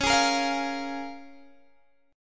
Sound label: Db 6th, Guitar, Open G